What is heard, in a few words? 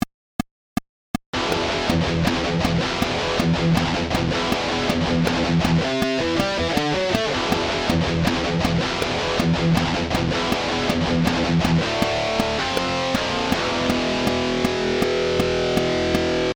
Rhythm